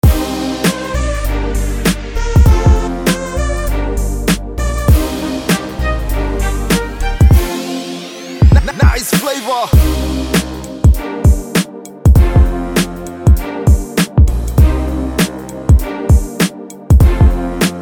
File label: beat